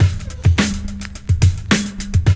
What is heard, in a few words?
LOOP; E-Lab; X Static Goldmine 2